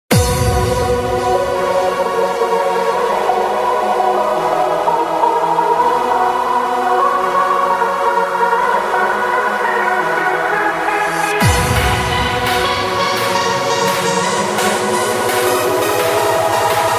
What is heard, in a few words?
Eternal Waves UK HC